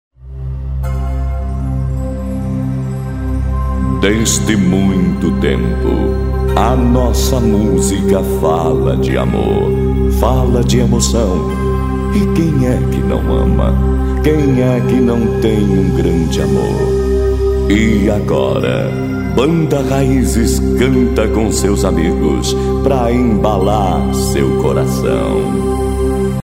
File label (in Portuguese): abertura